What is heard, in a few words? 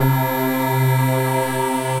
Evolving States, soaring leads